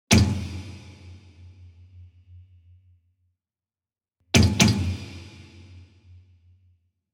Ethnic Drum Stabs, Ethnic drum stabs ideal for shock, stinger or tension; Musical